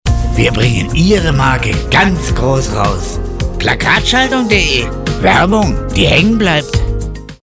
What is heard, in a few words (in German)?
Musik